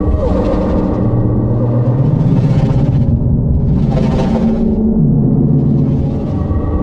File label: Crevasse; ambience